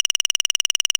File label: scroll, Interface Sounds; Kenney's Sound Pack